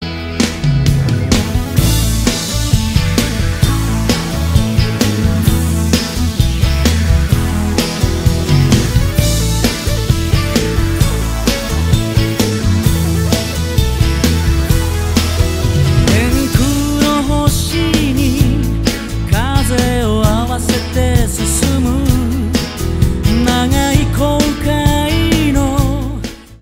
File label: ringtone; Umi Monogatari